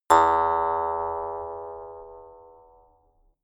mouth harp

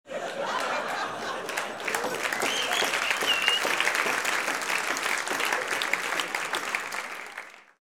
laugh applause

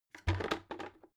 bucket rolling drop

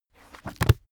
heavy book shut

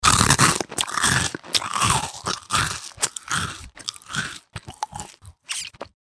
eat crisp with smack

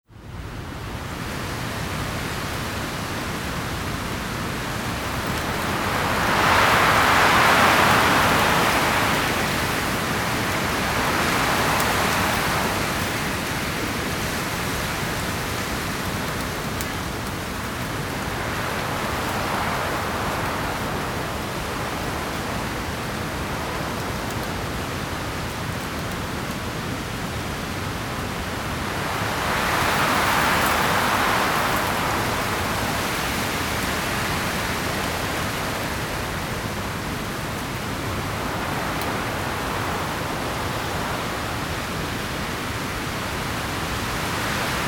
wind heavy

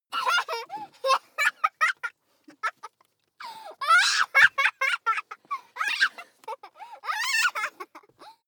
child laugh tickle scream sequence

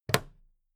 plastic clasp close regular

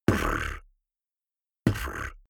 beatbox bass drum tongue roll damped

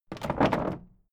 old wood case wobble

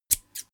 single scissors

